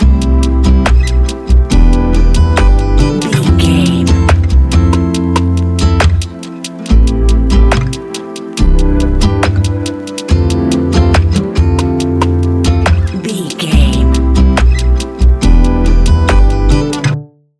electronic moonlight, A relaxed and chilling piece of music